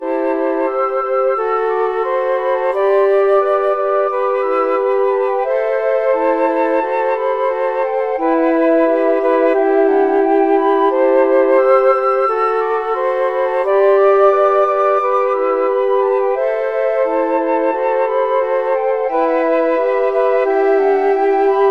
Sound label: flute quartet